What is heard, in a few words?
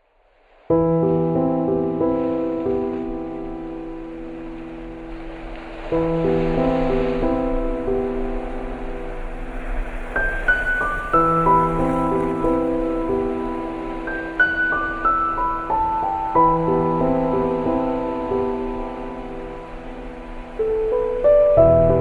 Meditation Spa with Ocean Waves